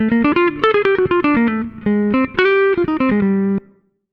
FK SOLO, Jazzistic; FNK

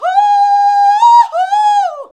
VOX VOX; Sound & Vision - Gigapack II CD 2

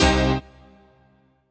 HOUSE, House Dance

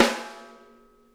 RIM, snare; Sonic Reality; Interactive Drum Kits

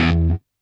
guitar stab

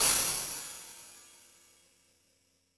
White Noise Crash, Drum Hits; Zero-G; Soundclash

